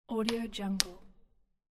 crackle

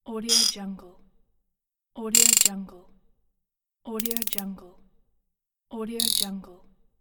wrench

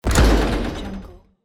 industrial door slide

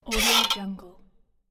old schooner boat lower deck door handle

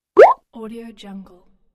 cartoon bloop

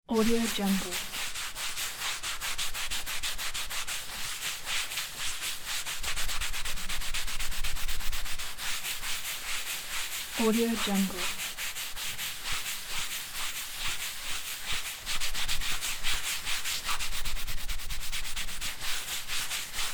wiping off counter

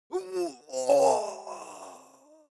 human vocal male death cry

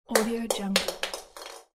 plastic balls falling and rolling

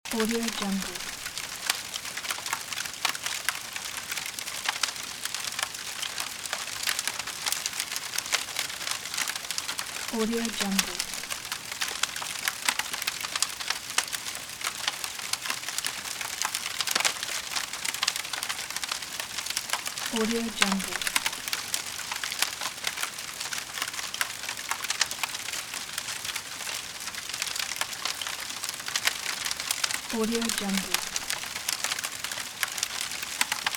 medium fire with crackling

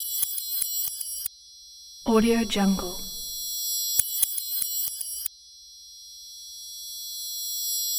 magic glitch; osproducts

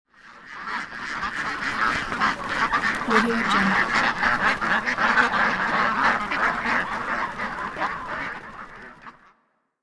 a geese

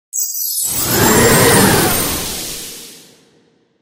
christmas whoosh transition

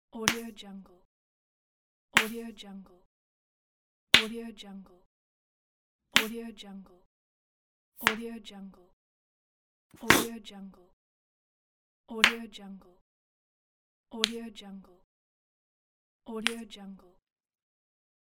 bubblegum pops; applehillstudios

